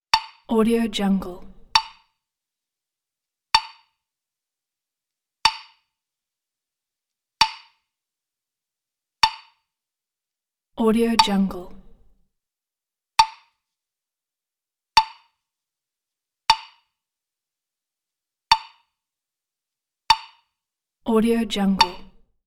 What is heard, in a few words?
woodblock hit